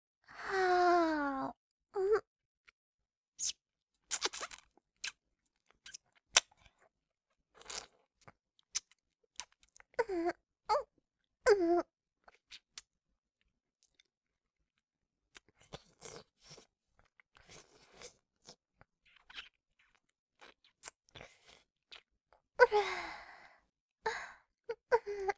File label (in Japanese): ちゅぱ音, ASMR